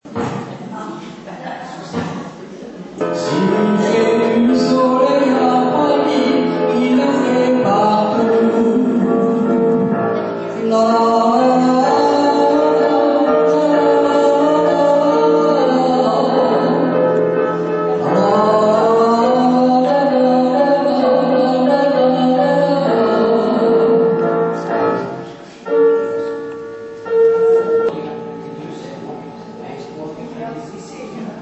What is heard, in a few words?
piano